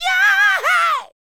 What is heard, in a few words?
Male Scream; Sound Bites; Hip-Hop and RnB Vocals